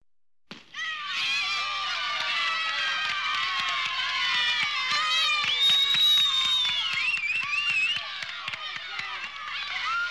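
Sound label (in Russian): игра в бейсбол удар крики свист аплодисменты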